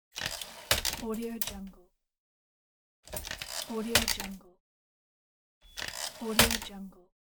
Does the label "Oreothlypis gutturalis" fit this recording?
No